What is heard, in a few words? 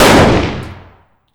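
fire dist 556x45 rifle int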